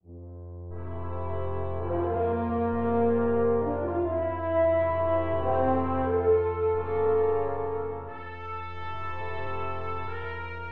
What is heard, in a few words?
Brass